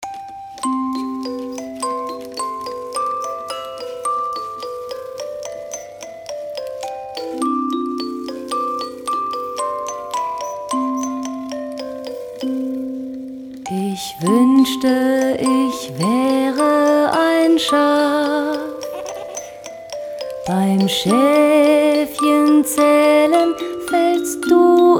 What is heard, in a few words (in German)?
Schlaflied